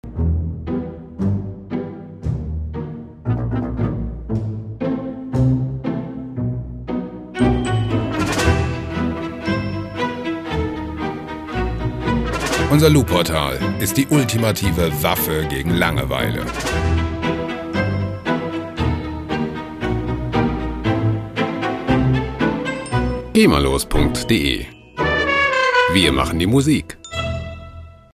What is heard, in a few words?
pizzicato